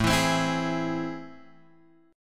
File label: A Minor Major 7th, down, Guitar, Drop D